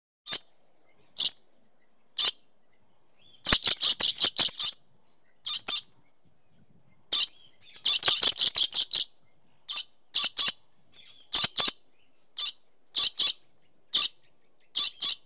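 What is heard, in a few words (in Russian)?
попугай лори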